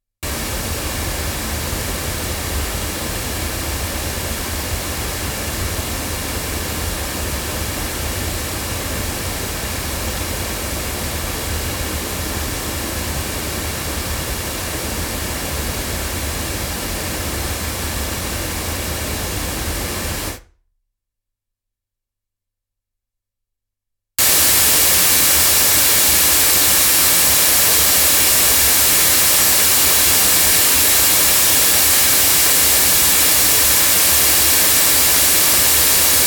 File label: Violet Dolly loudspeakers, microphones